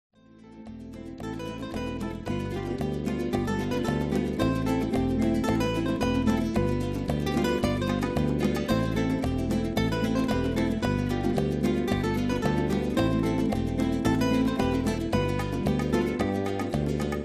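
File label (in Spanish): Guitarras Nuevas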